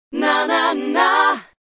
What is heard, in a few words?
style, African vocals sung